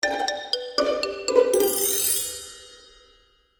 Magical Music Finale, Cartoon Music Sound Effects

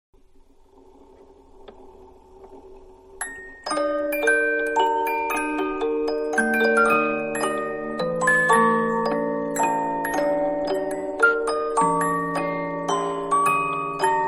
Music Box Tune; Manhattan Production Music

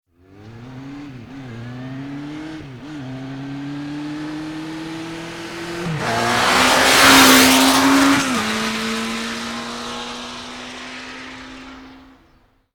Motorbike Honda 1100 Pass very fast; Motorcycle; Tom Hutchings

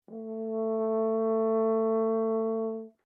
HORN A4 P LONG CRES, French Horn in F; Alexander French Horn in F; Horns (Sound Effects:Musical)

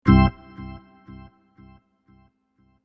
Organ Stab, Cheesy electronic organ stab.; Miscellaneous Musical

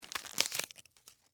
Foley, Cigarette, Box, Remove, Foil; Smoking; Human

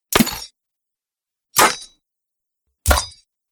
Hits Pierce Chainmail Armour; 3 Hits; Battle Sound Effects

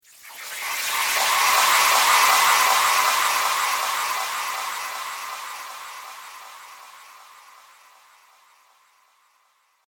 Tangled Spell Fantasy Magic; Andrew Fleming

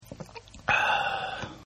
Drink Swallow; Drinking; Human

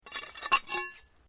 Glass move; Material components for constructing more complex sounds; Materials

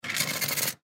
Weld Steel Short Burst Welder; Welders; Machines; Industry; Blastwave

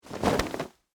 Jeans Shake, Foley, Clothes; Dressing Sound Effects; BlastWave

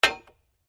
SLEDGEHAMMER METAL IMPACT HEAVY; Working with a sledgehammer; Hammers; Tools

